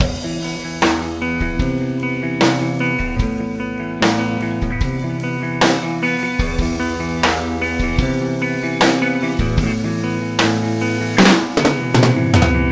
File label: loop guitar